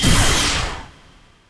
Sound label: fail; RYL 2